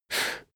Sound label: male breath fast in